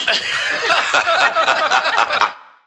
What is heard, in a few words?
laughter evil group; ooh ahh